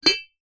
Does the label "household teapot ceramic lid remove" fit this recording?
Yes